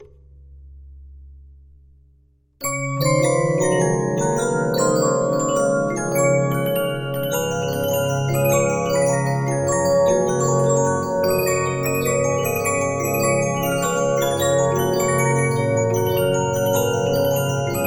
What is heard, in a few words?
Regina, 15inch disc musicbox